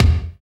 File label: BD, BD Dance; Sound & Vision; Gigapack I CD 1